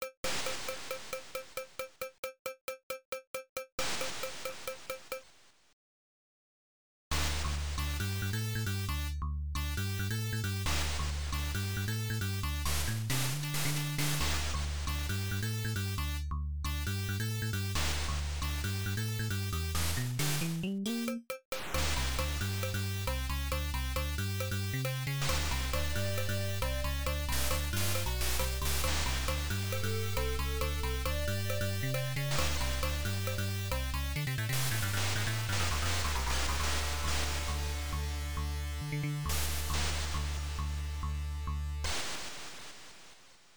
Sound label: Broken beats; pc-9801